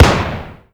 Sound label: Deagle